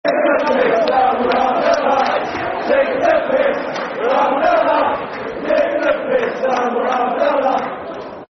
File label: Football Chant (Soccer Song); Taking The P Ss; Sunderland Safc